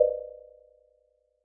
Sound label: Stereo Tone